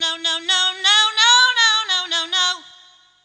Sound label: Sing Voice No No, Choir